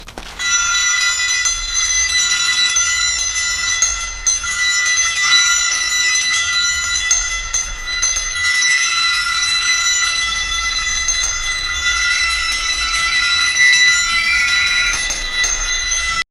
almirah opening sound, clattering

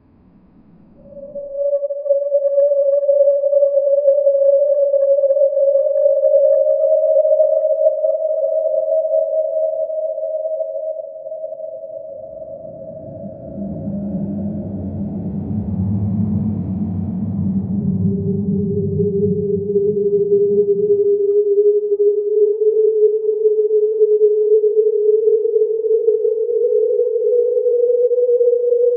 scariest sound imaginable

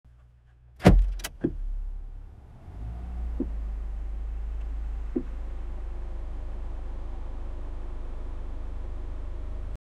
car stops sound of car

